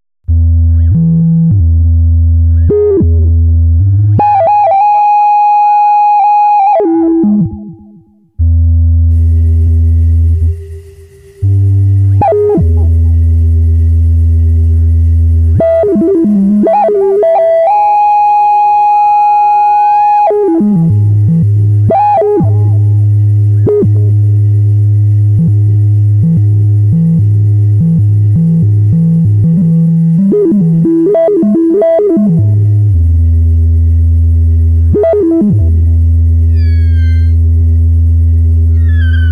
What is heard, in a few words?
theremin; ghostengine